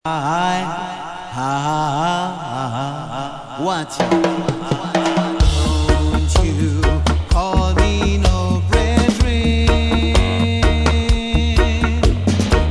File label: Melodica Cut